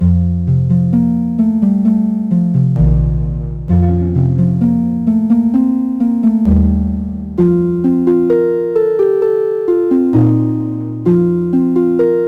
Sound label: Jingle Score